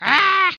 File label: Grunty